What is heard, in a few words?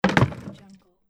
wooden shield break; stormwaveaudio